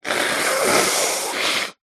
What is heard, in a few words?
female nose blow